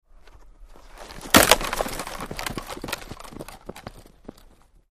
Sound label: Football Block Run Aw, football